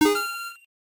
Mart buy item; Pokemon Essentials; PKMN Estelar 1.3

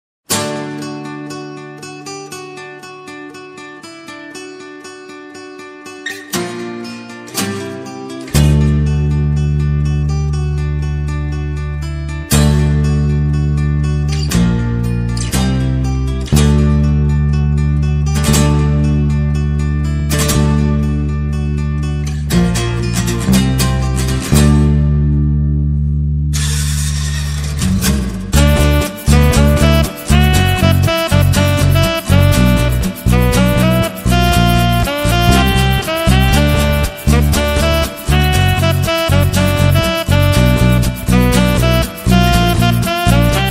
Acoustic Ver

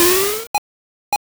Baton Pass; Pokemon Essentials; PKMN Estelar 1.3

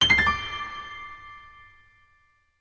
cancel; easyrpg; WitchsHeart

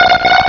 Cry

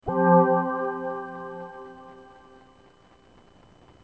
enter; easyrpg; WitchsHeart

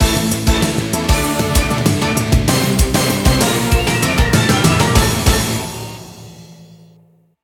Normal, Music; TMNT

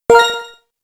Menu Choose; Pokemon Essentials; Pokemon Circon BETA 2 v1.8

